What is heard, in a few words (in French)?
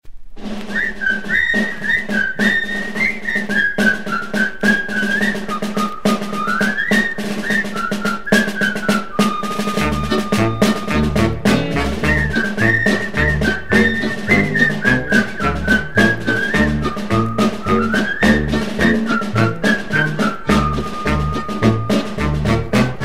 chorale